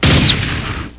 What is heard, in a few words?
gun; Quake2; Screensaveri